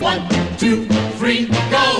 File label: 1 2 3 go group SUNG